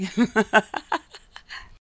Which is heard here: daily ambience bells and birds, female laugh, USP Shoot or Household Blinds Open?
female laugh